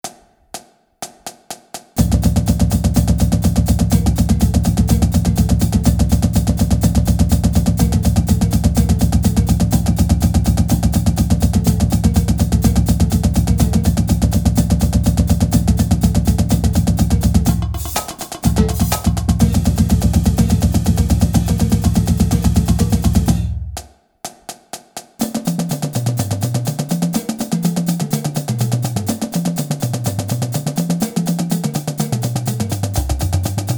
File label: Stick Control, drumline